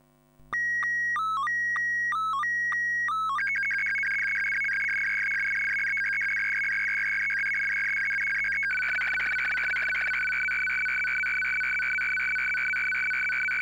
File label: ATV24, SSTV Signals; hamradio; AVT